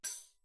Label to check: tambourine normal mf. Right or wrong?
Right